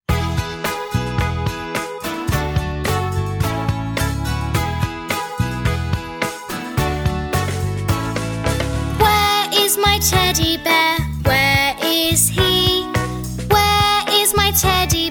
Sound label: karaoke